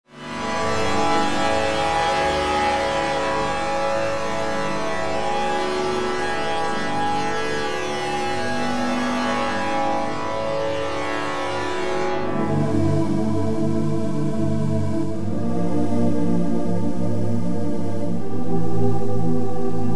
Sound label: pads; hive eclipse